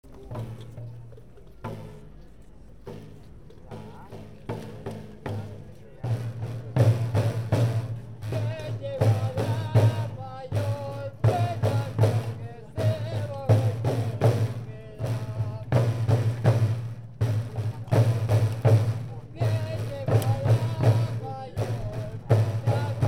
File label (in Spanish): Baile De Tambores; Kan Gomera; Valle Gran Rey